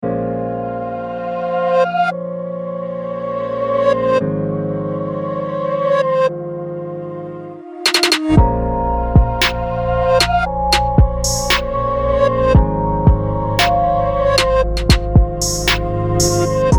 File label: moody hip hop; problems moody hip hop; big fish audio